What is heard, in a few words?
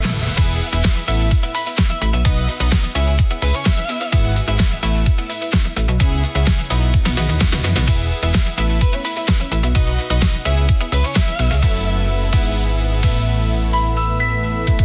loop